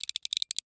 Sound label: Emergency Handcuffs Clicks; emergency effects; AdobeCC